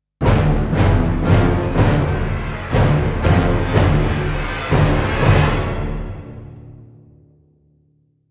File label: Epic Orchestral Stinger